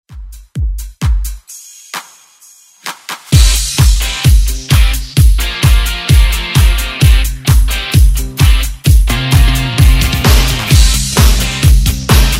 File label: Classic Dance; Redrum